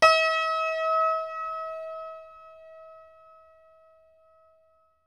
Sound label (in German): HACKBRETT E; Best Service; World Colours